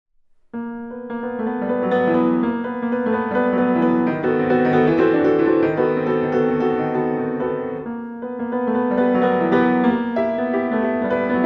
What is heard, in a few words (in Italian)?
Reiselied (versione per pianoforte)